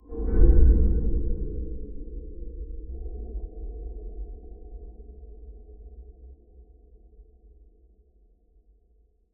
impact cinematic x2 muted distant dark bass slow attack with metallic